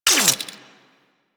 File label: Quill Dart Gun Electric Mech; Warframe